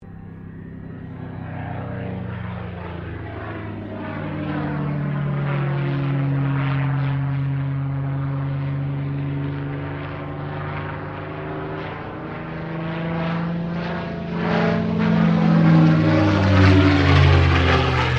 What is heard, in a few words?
AIRCRAFT CAC CA-18 MUSTANG MK23 PASS STEREO